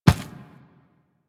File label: Inf Medium Run Default, Infested Footsteps; Warframe